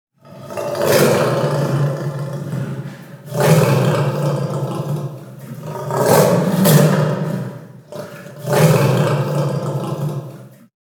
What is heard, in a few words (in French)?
lion; loto sonore; lotodesanimaux